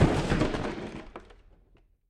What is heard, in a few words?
hit; Lux Ahoy